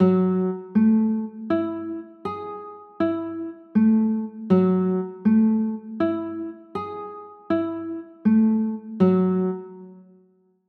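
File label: G flat m9 chord, guitar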